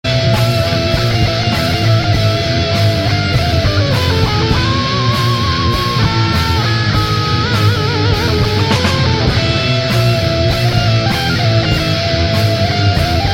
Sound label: heavy metal ringtone